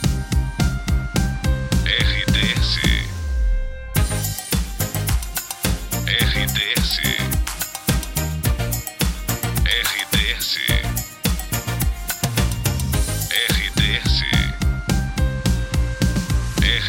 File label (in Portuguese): trilha para locução; Rádio Panorâmica FM de Campina Grande, Paraíba, Brasil